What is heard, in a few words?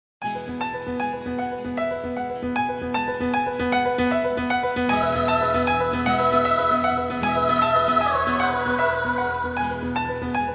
X'an Devotional Karaoke; christian devotional